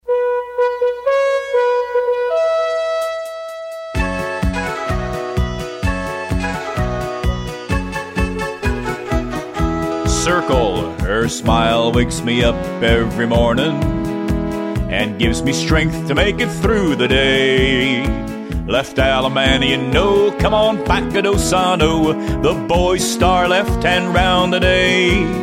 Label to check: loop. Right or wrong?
Wrong